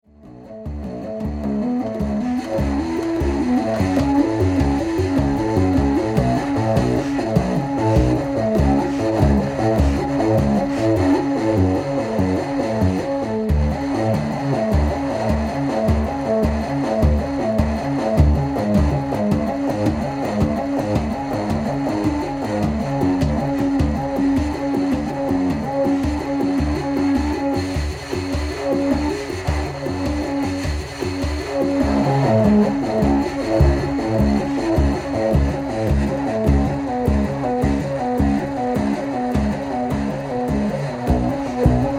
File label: WHEEL TRANCE, circular hocket